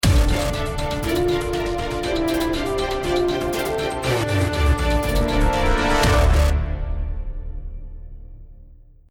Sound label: round mvp anthem